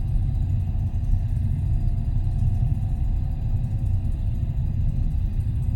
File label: amb sensor station idle; Stellaris; other entities